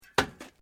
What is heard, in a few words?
Metal Light Movement Minor